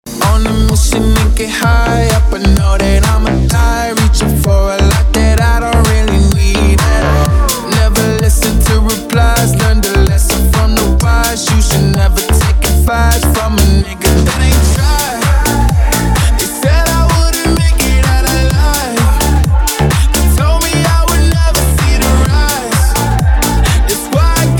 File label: Club Party Banger